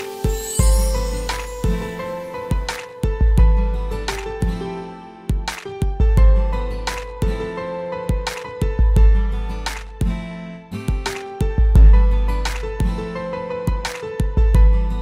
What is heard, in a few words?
Instrumental Song Ringtone